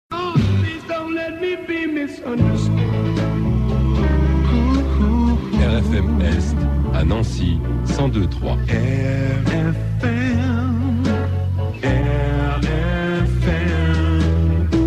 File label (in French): Jingle duo; RFM Est